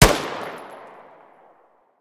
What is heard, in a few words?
Maxim, Guns Fire